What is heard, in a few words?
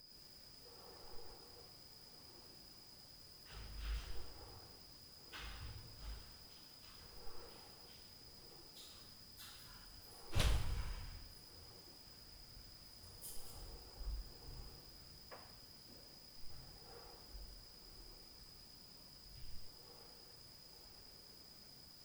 Sleep + DOOR NOISE; Night Noise